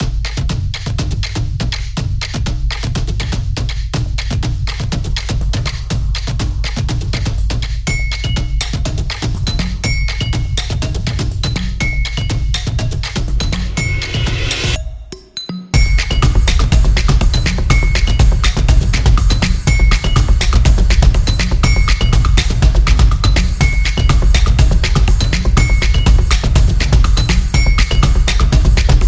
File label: Opener